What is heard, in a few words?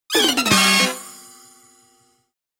color splash